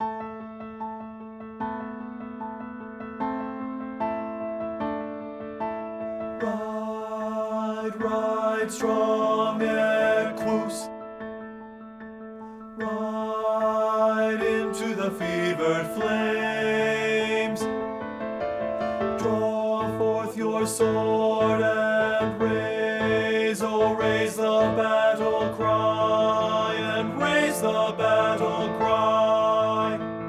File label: BASS